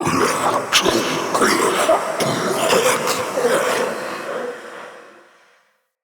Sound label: Male Vocal Stand Long, Enemy; Mockery; Mockeries